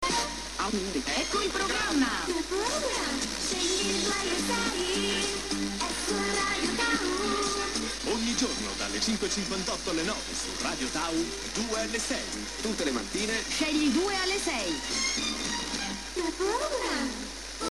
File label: jingle